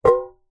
foley tin metal cylinder lid on pick up from concrete; zapsplat